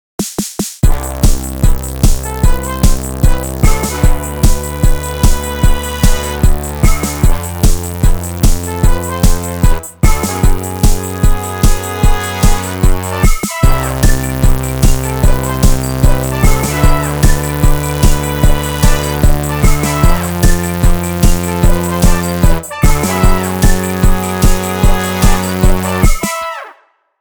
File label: jingle